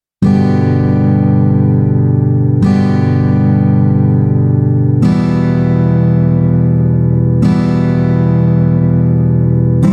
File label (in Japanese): 全音符, ギター; ギター講師が教える1日で弾ける初心者用ギター